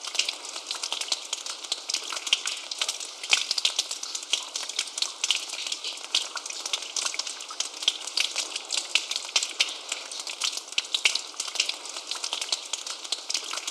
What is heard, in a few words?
Splish Splosh Rain